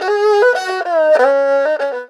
ERHU LICKS

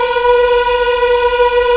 VOX African; Roland LCDP11 Africa VOL-1

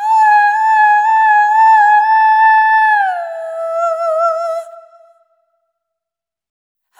Servitude, vocal samples; Sonic Foundry (Sony Creative Software) - Pocket Diva World Class Vocal Samples; Op-Erratic Loops

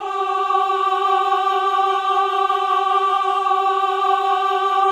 VIB G4, VIBRATO, Voices; Spectrasonics

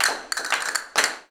CASTANETS, Flamenco

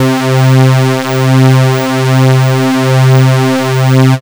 Synths Note C

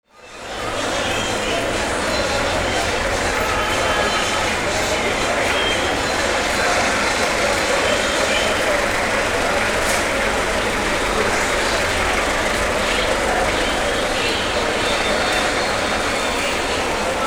AMBIENCE, Arabian Traditions; USB Soundscan vol.43; AKAI

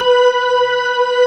P-ORG2 B4, ORGAN; AKAI S-Series CD-ROM Sound Library VOL-8

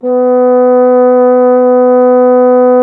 trombone; Miroslav Vitous; Solo Instruments VOL-1

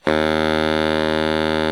baritone FF D#1, Sax; Giga Samples Collection

